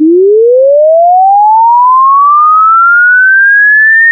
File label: sweep sin